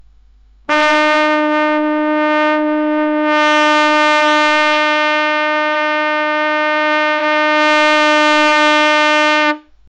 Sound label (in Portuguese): crie o som de um, brass